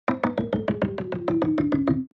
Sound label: Cartoon descend